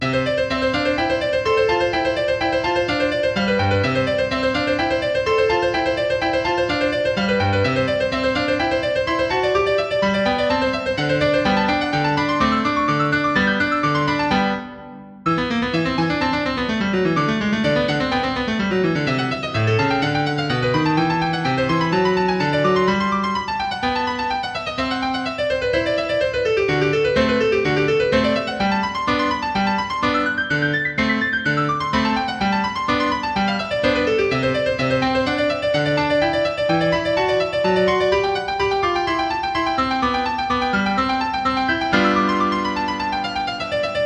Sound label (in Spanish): ESTUDIOS PARA PIANO; J.B.DUVERNOY 15 ESTUDIOS PARA PIANO Op.120 No.11